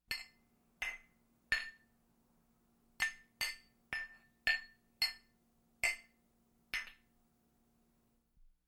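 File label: alternating clinking of glasses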